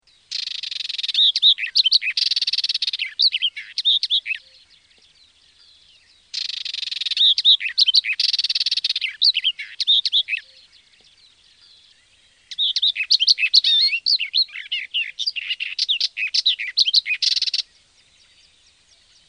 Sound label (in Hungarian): Karvalyposzбta; Madarak